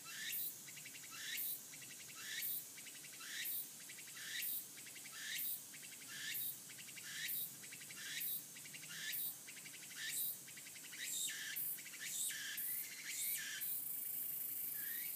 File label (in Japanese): ツクツクボウシ